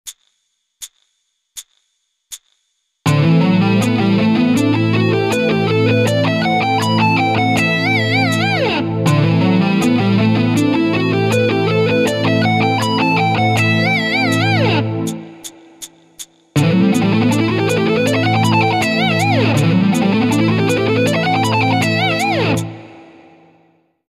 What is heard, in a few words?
economy picking